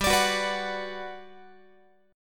G Major 7th, G, down, Guitar; Listen to GM7 strummed; GM7 Chord

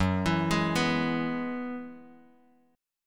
Gb 7th Suspended 2nd Suspended 4th, Bass Standard; arpeggiated; JGuitar

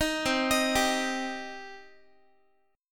C Minor C down Guitar; arpeggiated; JGuitar

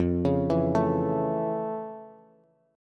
F Augmented Major 7th F Bass

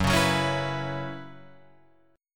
Gb 7th Suspended 4th, Guitar Open F; Gb7sus4 strummed

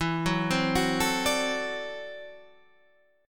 D 9th E, guitar; D9/E; arpeggiated

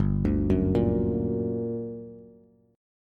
G Minor Major 7th, down, Bass; GmM7 arpeggiated; JGuitar

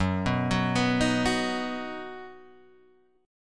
Eb Minor Sharp 5th, Gb

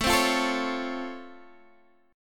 C sharp Augmented Major 7th A, chord

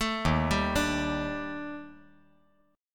D sharp Major Sharp 11th D sharp, chord; D#M#11 arpeggiated; JGuitar

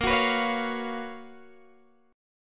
Gb Major 11th Bb, chord

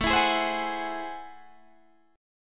A Minor Major 13th, down, Guitar, Open D

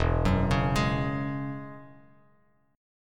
E 6th Add 9th E down Bass Standard

